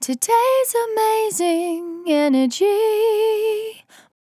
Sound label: On A Star 65bpm F, Vocal; Zero-G